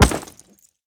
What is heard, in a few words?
defuser impact; physics; weapons